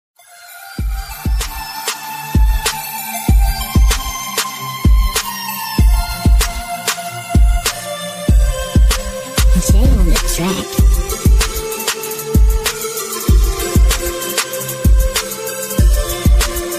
beautiful instrument ringtone

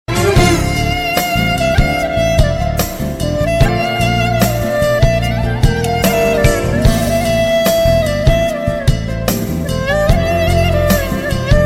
sad ringtone

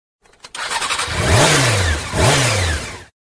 motor bike msg ringtone

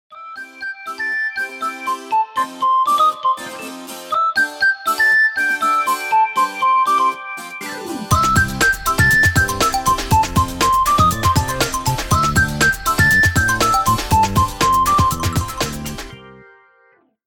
ringtone, nokia ringtones

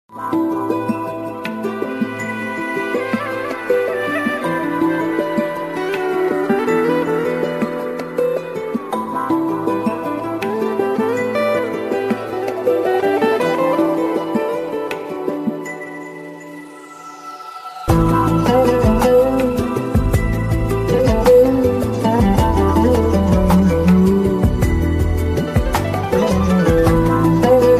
arabic flute; arabic flute 1

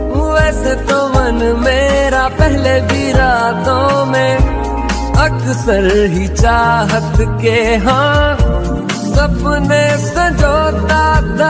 love music hindi ringtone

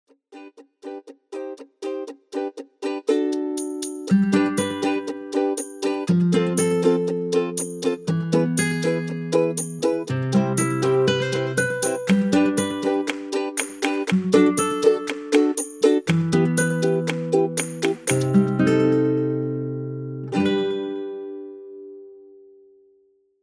ukulele ringtone; bollywood

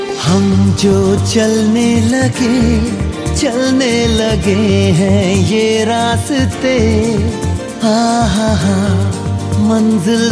ringtone; bollywood ringtones

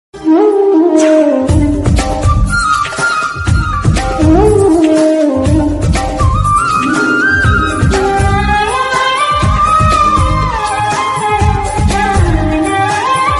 love instrumental background music tone ringtone; bollywood

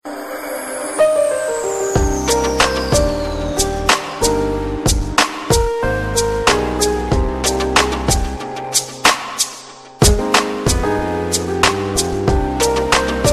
piano ringtone

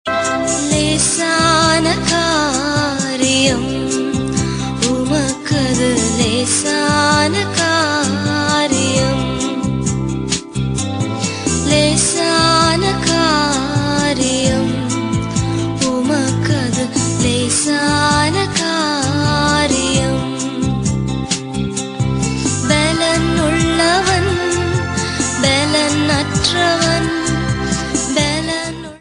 devotional ringtone

